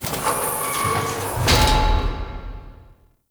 Piston Machine; Ambience; Props; Dante Unbound; Warframe; Entrati

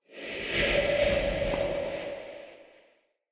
Staff Caster Charge Heavy, Weapons; Player; Staves; Galene

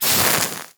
Axe Projectile Impact; Warframe; Weapons; Tenno; Sundial

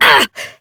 Pain Short Operator Voice; New War

